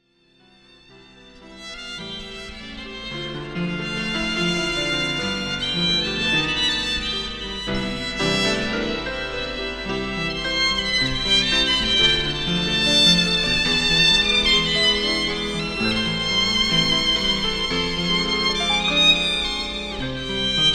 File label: violin